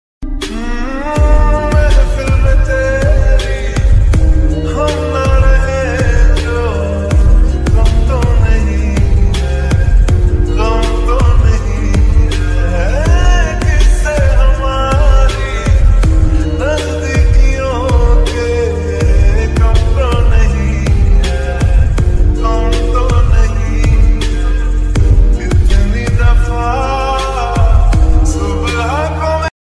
lofi ringtone love ringtone romantic ringtone slowed reverb ringtone bgm